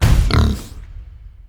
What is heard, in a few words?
projectile launch; Dota 2; Swine of the Sunken Galley